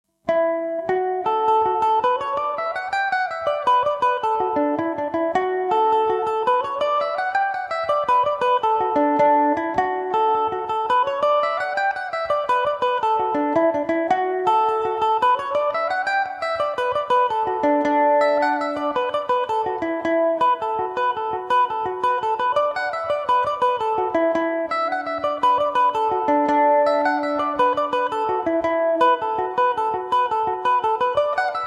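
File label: whistler at the wake D major revelation, mandolin; Revelation Jaguar electric mandolin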